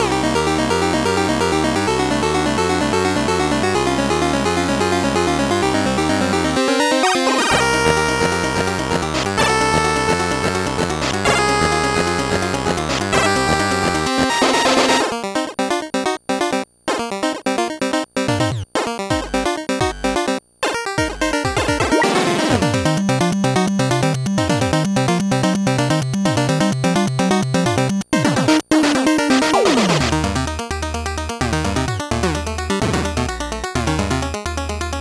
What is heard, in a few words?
Mania Chiptune